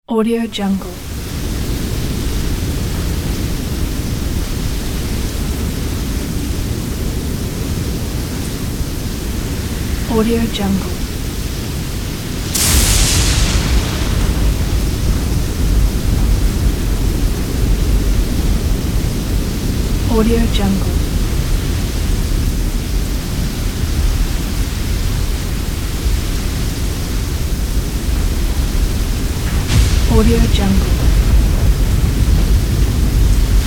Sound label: violent thunderstorm with wind and lightning; sound ideas